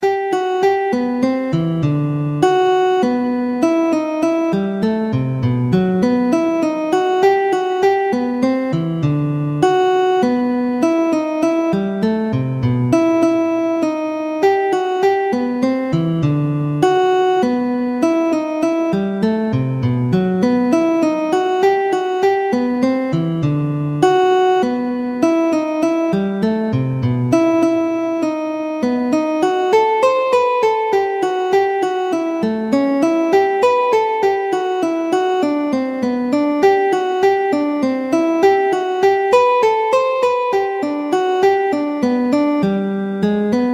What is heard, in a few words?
cello; bach johann sebastian; bach bwvllo suite n 1 6 minueto 2